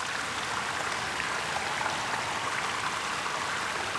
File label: ruin waterfall